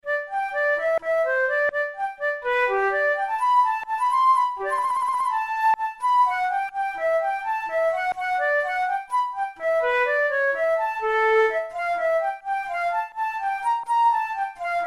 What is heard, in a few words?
bach cello suite no1 gigue, flute